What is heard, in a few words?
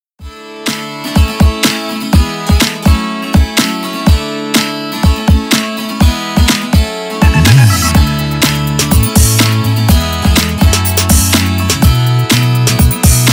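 guitar beat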